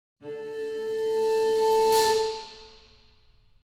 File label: Video game reward chest opening nordic